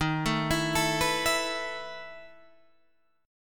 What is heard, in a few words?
B 11th D sharp, chord